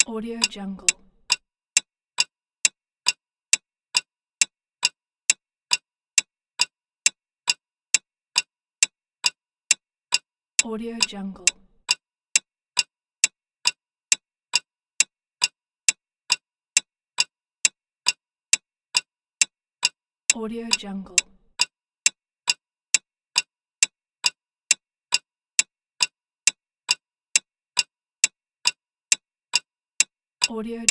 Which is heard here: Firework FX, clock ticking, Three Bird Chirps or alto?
clock ticking